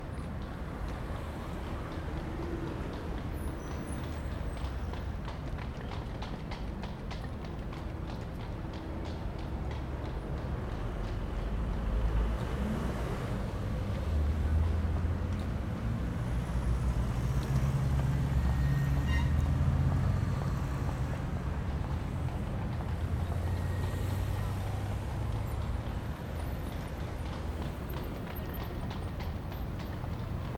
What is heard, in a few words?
LON EXT STREET MORN